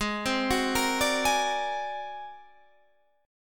G sharp 9th Flat 5th G sharp; G#9b5 arpeggiated; JGuitar

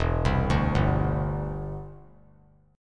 E Minor 7th Sharp 5th; arpeggiated

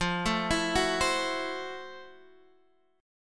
F Major 7th Flat 9th, F, guitar; arpeggiated; JGuitar

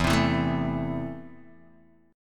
E Suspended 4th Sharp 5th E, Guitar, DADGAD; down

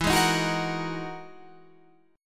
E Major Sharp 11th E, Guitar, Standard; EM#11 strummed; 12,11,13,11,11,14; JGuitar

